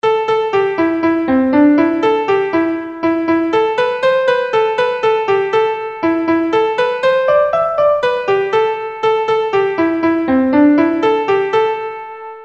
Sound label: melody